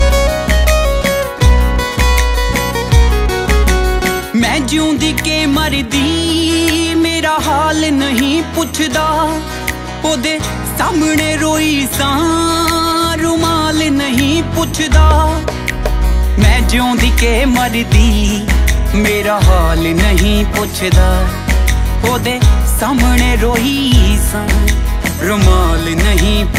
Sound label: Sad Punjabi Song Ringtones